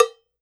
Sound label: COWBEL, Salsa Kit; Drums&Percussion; E-MU Producer Series Vol. 7 – Old World Instruments